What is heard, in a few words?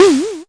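mbrute percussion, Arturia MiniBrute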